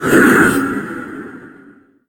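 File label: attack vox; gundyr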